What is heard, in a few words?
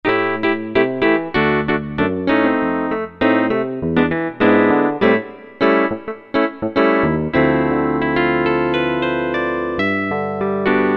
60s El P, Yamaha QY70, XG Voice; XG Voice examples